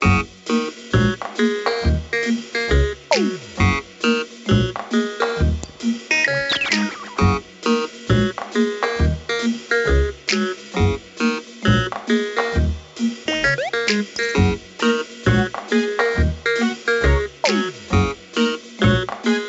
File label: Menu Loop; Scoyo Menu Loop; Spielend Mathe lernen; NDS